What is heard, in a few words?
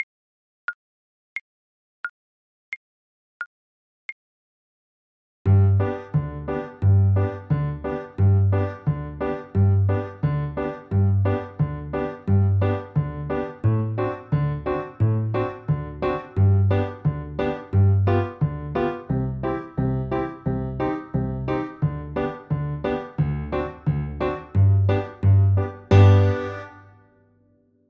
when the saints, oboe